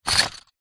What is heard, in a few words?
office padded envelope containing small plastic items pick up; zapsplat